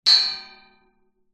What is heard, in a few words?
impacts metal gate pole hit with small stone